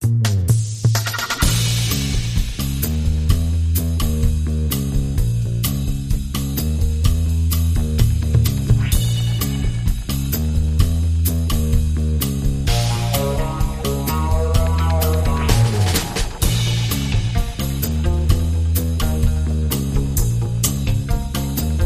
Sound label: Dead Of Night Drums & Guitars